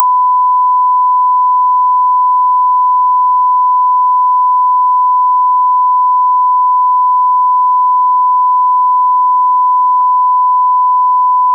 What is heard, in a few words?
Crosstalk; Rohde & Schwarz; UPA; Rohde & Schwarz UPA-CD